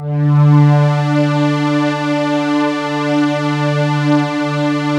STACK; Stack 1

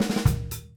FILL, Grooves Filz Hitz; PlatinumPhattCD1; E-MU Producer Series Vol. 8 – Platinum Phatt

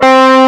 EG OD C3; AKAI S-Series CD-ROM Sound Library VOL-2

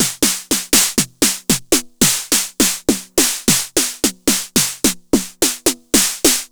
909 mod SN - SNARE DRUM

